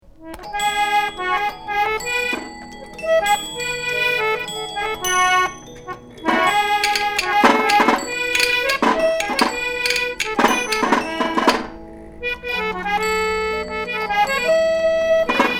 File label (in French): boite a musique